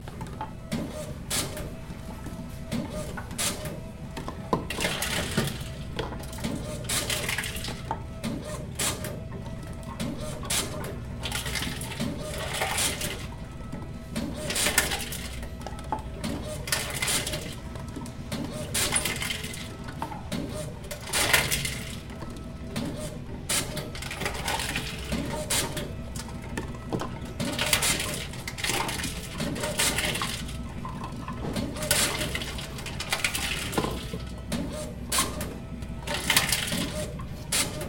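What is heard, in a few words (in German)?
aldi pfandrückgabe schredder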